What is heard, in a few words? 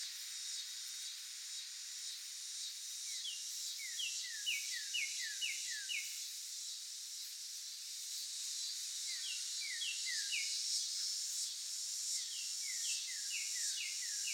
Cicada pulse